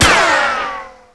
cg metal; weapons; Tribes2

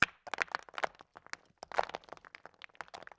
gravel, environment; Tribes2

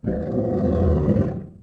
growl; Tribes2

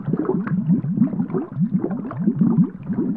bubble trail; Tribes2; armor

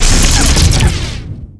shocklance fire, weapons

